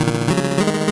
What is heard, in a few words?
8bit se